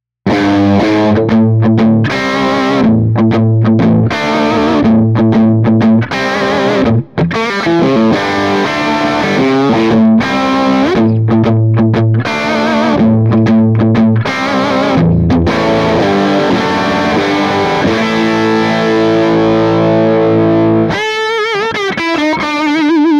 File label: Channel 2 Gain Les Paul